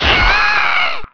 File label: death; Alien Arena; firebrand